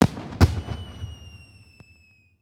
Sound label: Celebration Fireworks